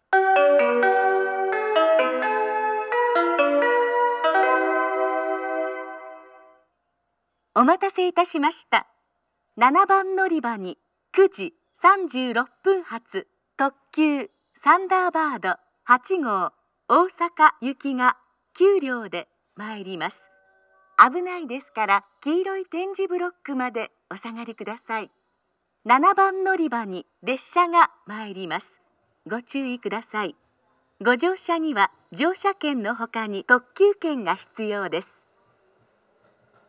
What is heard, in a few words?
limited express, kyoto, station; thunderbird8; osaka